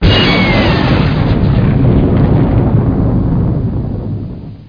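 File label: big explosion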